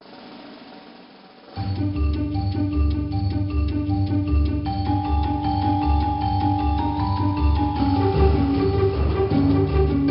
Music Race; Animal Life Australia Eurasia North America Dinosaurs; nds gamerip

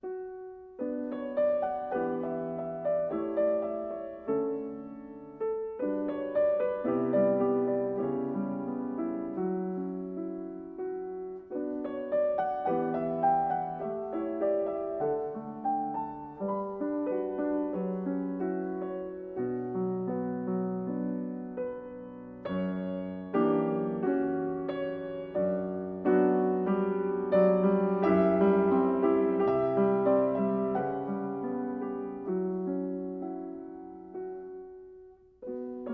Piano Etude; Rule of Rose

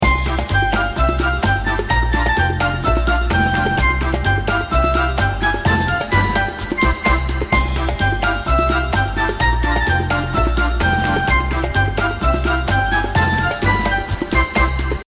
bgm comedy; heartwarming store game mangobuku marche asobox; android game music

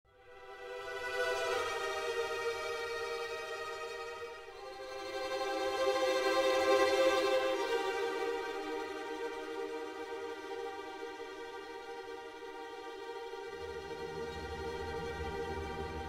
Opening Strings; The Amazing Spider-Man 2

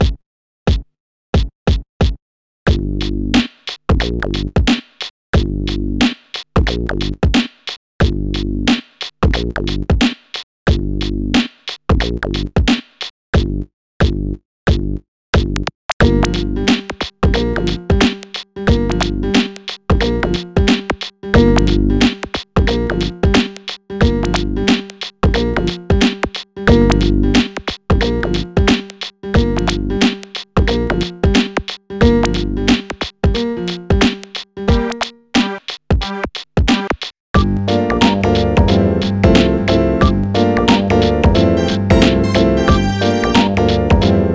Hip-Hop Stage (Karaoke); pinky street kira kira music night pop town gamerip

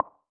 tile

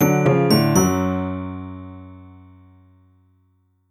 Level Music Over

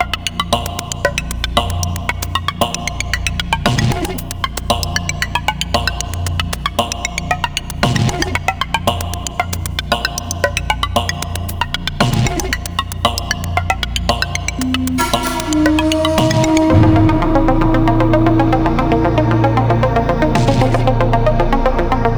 Ballistics Main Alt No Kick

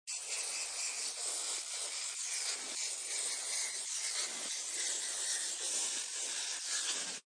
whipped cream; papa s freezeria gamerip

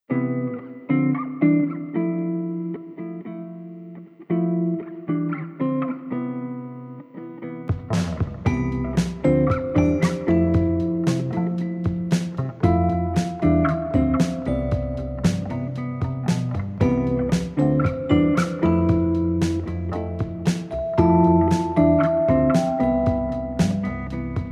Indie Rock; IKEA Smart Home Planner

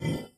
Spike; Doodle Jump 2; android

